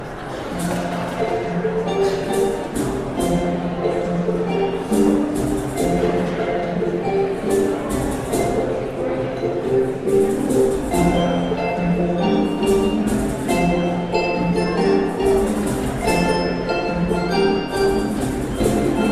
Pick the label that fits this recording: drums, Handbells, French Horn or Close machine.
Handbells